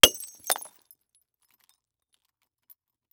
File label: Household Glass Wine Bottle Break On Cement